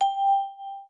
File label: ding